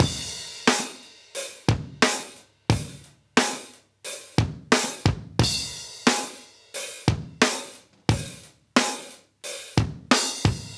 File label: Live Breaks, 89